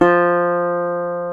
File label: GTR, Dobros, National